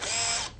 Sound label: light bot move